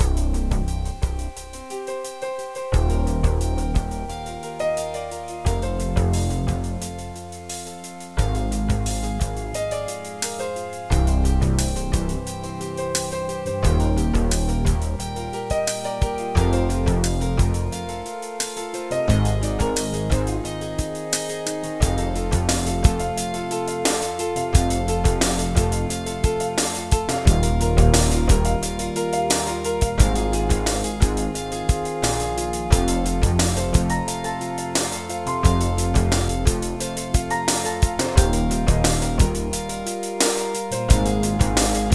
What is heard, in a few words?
JAZZ